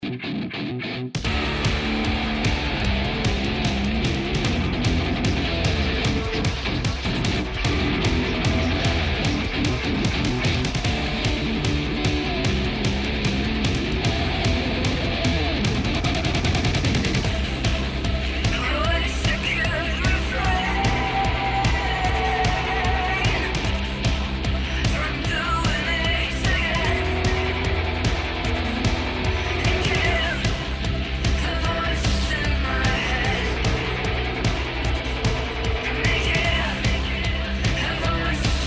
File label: Voices Mix